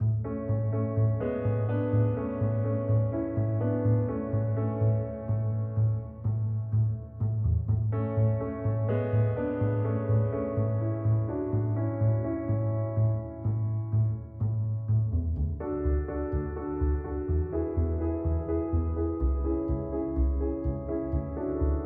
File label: light jolly